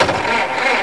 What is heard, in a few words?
starter; svencoop; hc2